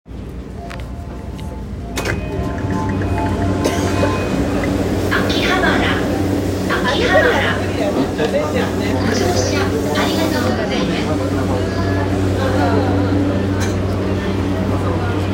JR 山手線 秋葉原 CCW, train sounds

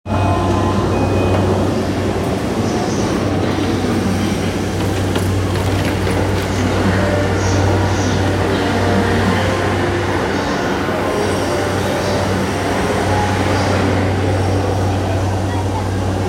JR 山手線 浜松町 CCW, train sounds

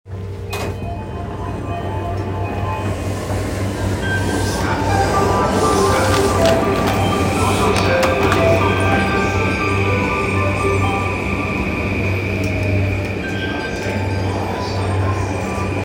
JR 山手線 大塚

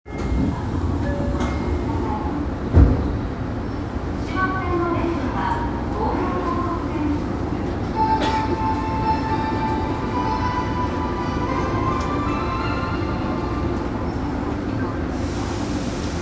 東西線高田馬場東, train sounds

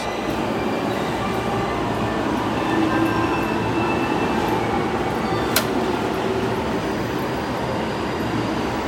JR京浜東北線さいたま新都心北, train sounds

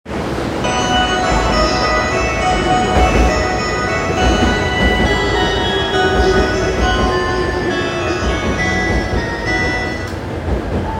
中央線快速新宿東, train sounds

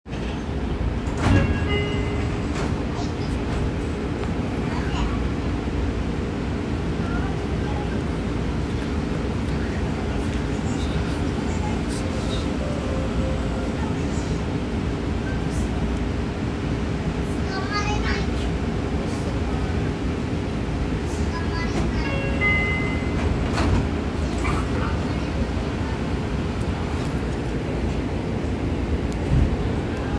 池袋線稲荷公園西, train sounds; 西武

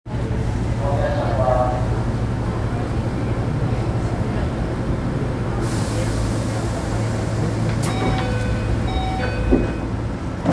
京王線北野東

